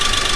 matiz, Vehicles

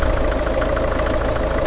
citroen, Vehicles

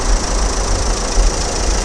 renault megane, Vehicles

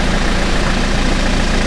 bmw, Vehicles

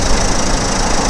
renault megane, Vehicles

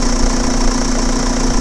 opel astra, vehicles

